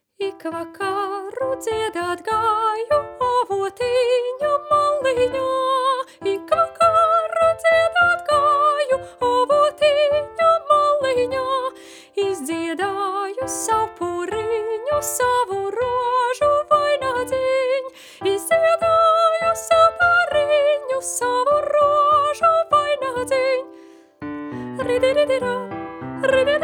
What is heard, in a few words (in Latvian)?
Soprans, vidusskolas jauktais koris; Ik Vakaru Dziedat Gaju; Apguves repertuārs vidusskolas jauktajiem koriem